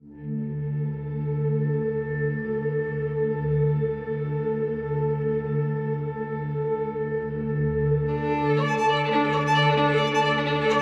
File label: Despair Violin